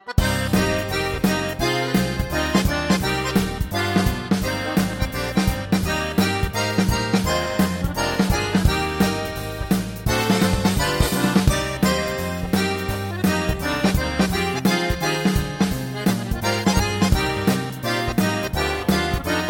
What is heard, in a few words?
Bayan Chromatic Button Accordion; Akkordica